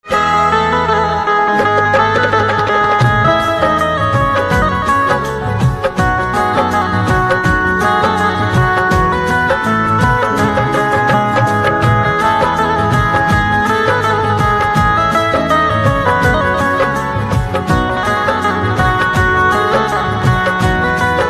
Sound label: Banjo